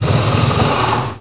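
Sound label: Impact big, air tools, industrial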